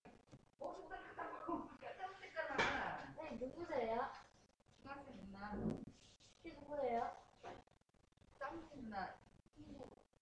throw

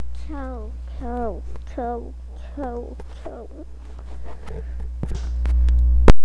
cow

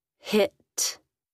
hit

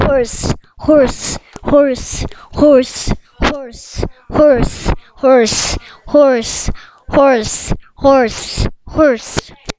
horse

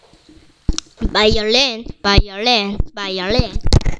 violin

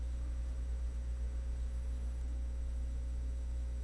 sharpen

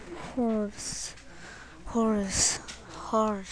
horse

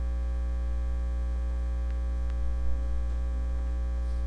chirp

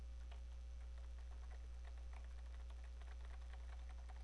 throw

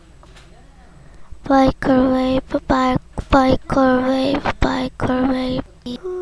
microwave

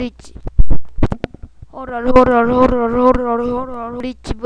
horror

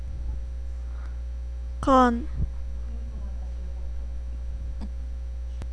gun

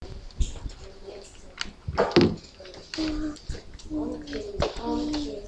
quail

